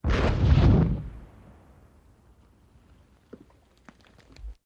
Cannon Mortar Shot

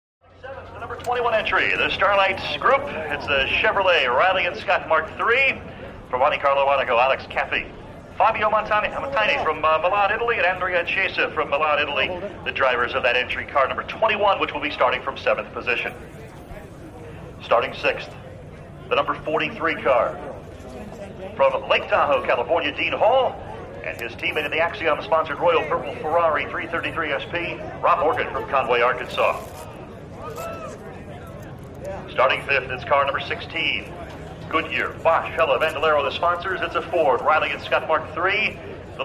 Pro Sports Car Race

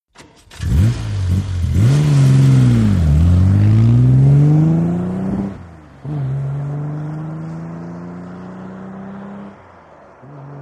VEHICLES ASTON MARTIN: EXT: Start, depart, faster

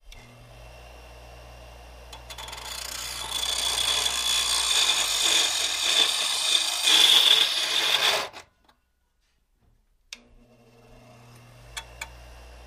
Elect Grinder Grindi, grinders

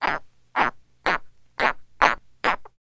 Frog Australian Tree

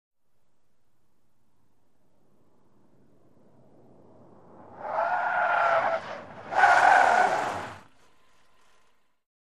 Sm Car Accl Skid, compact

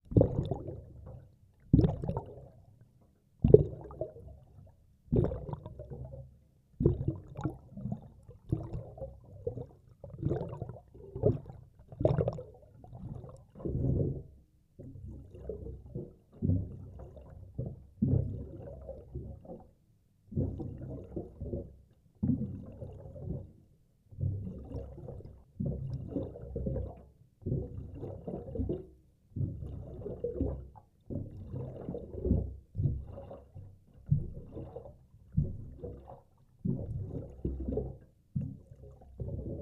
Underwater Movement

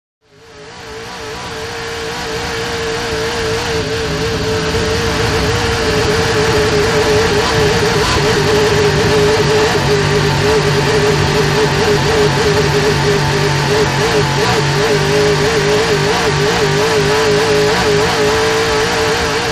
Boat In Out Race, motorboats

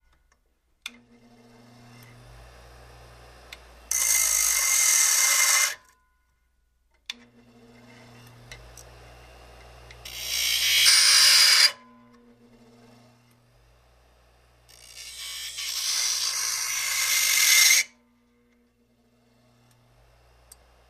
Elect Grinder Grindi, grinders

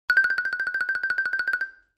Xylophone Trill Nor

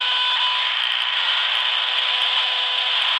radio jamming

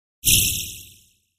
Hit HF Digital Pulse

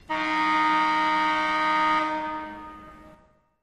Hockey Buzzer, Two Versions Of Game Buzzer; No Crowd With Echo

